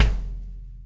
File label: Kick Shard; ZeroGravity Drum Shards